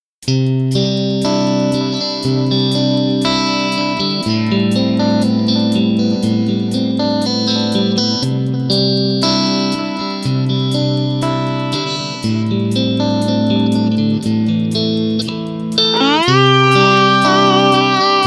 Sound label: russtone clean and distr